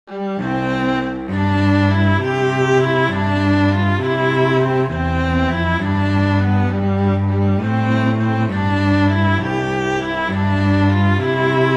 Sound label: I Saw Three Ships, Christmas Vla Vc